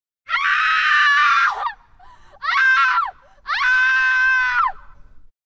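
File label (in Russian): женский голос истерический крик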